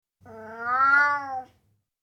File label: Burmese, Individual Meows, Cats; BBC Sound Effects Library